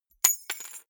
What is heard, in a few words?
key ring small drop parquet hard